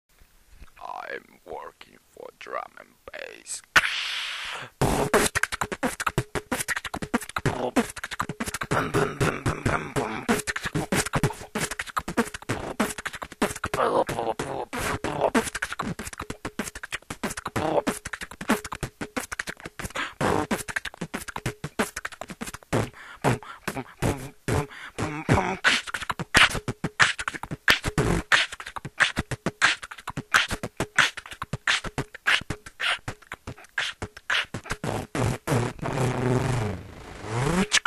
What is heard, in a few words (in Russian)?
DnB бит, битбокс